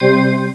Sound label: decrease stocks; picovga; vga ants